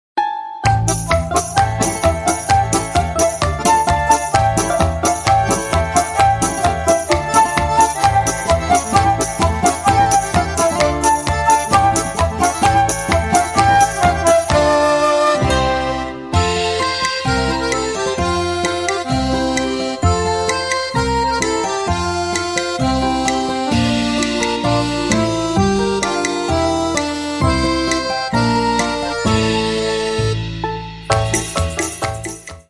russian folk kalinka

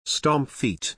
stomp feet; body percussion

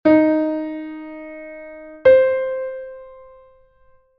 mib rebb

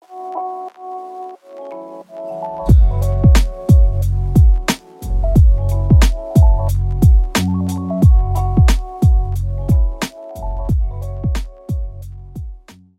chill vibes

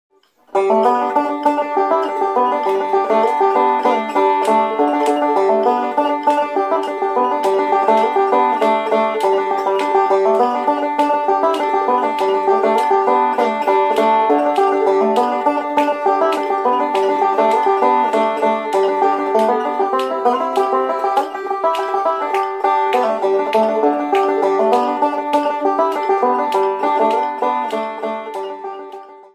Bluegrass and Clawhammer Banjo; Primeiro escoitaremos un anaco da súa coñecida melodía; Oh Susanna; Oh Susana!

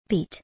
beat

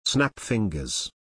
snap fingers; body percussion

